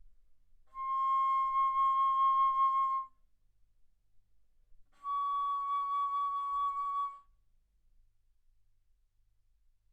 Bass Flute ff C6 Db6

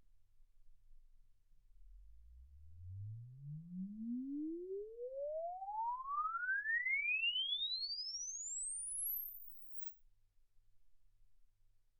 exp swept sinus 10Hz 24kHz

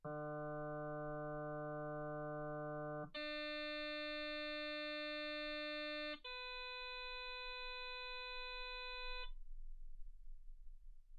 harm sinus 48kHz

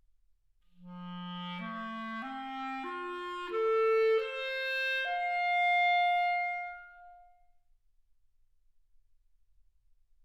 clarinet

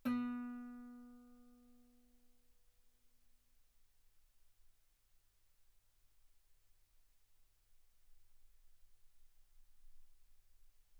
Guitar B3

